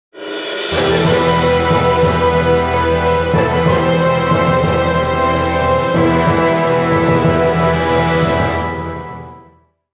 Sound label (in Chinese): 大气的背景音乐